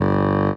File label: spear, Synths; Notes